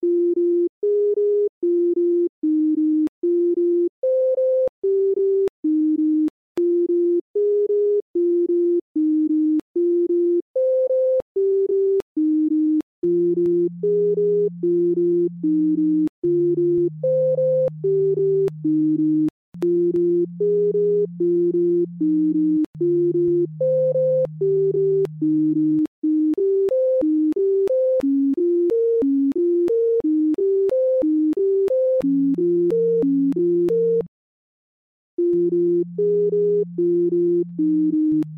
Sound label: sine wave music